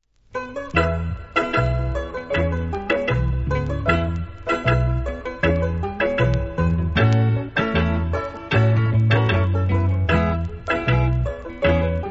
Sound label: Happy Hammond